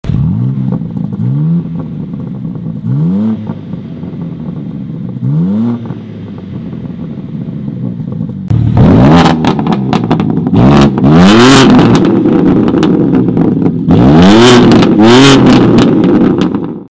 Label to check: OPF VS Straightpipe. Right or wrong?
Right